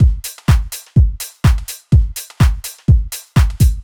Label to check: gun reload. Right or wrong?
Wrong